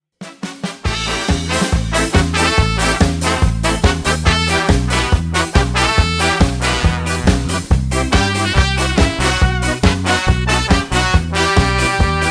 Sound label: instr